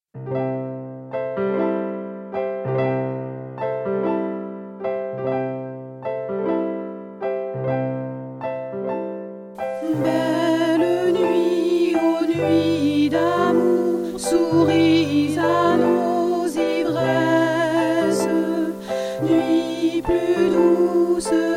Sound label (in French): barcarole voix 1 et 2